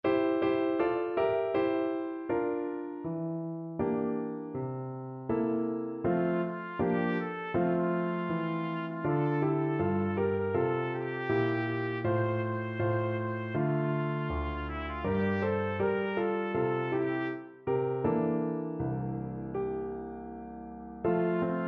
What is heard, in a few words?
trumpet